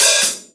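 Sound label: ambient hi-hat pedal, Acoustic kit; Akai MPC2500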